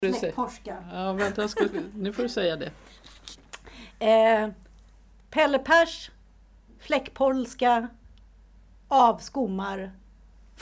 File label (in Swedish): fläckpolska; Pelle pers